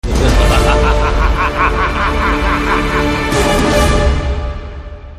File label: Laughing; Rajinikanth